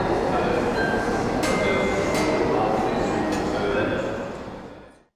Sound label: Metro Station Ambience